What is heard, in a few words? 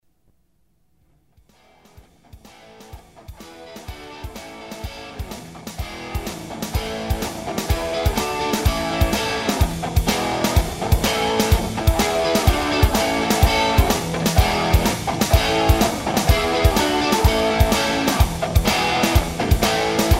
Riff